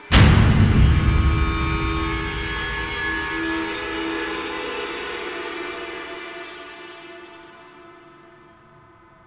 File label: epilogue sting